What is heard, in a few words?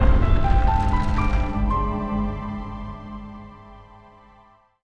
Boss Door Unlocked; Nintendo - Kirby's Epic Yarn; Video Game OSTs